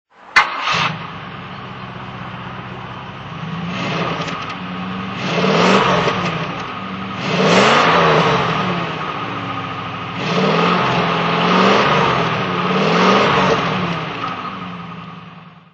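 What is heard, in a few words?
rover p5b throttle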